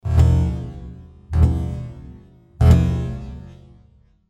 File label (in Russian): v derevjannuju dver